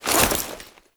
Bayonet Melee Whoosh Gear Accent; Warframe; Tenno

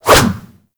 Staff Swing; Warframe

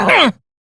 Pain, Male; Warframe; Railjack; Perrin Sequence

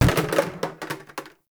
Plastic Hit

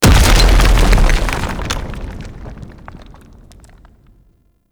Golem Emerge; Warframe; Derelict

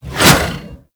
Scythe Whoosh; Warframe; Weapons; Stalker Scythe

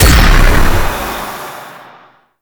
Stun Burst; Warframe; Stalker

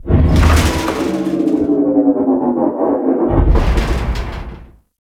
Ship External Machinery; Warframe; Corpus Ship Remaster

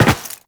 Land Metal, Footsteps; Warframe; Tenno

